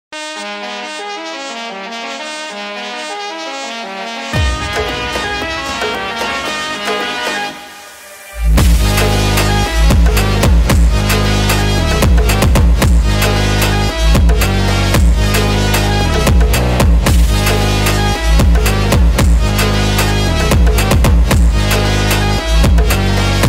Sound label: Mexican Phonk sped up